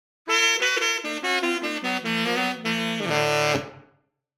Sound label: Sax Melody; Hollywood Edge; sound designer's toolkit v2